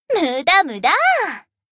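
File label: attack; asuna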